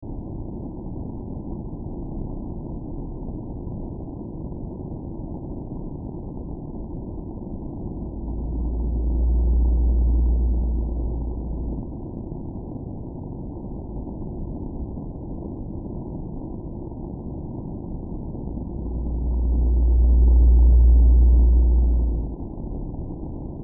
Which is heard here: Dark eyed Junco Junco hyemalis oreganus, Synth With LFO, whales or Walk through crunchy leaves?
whales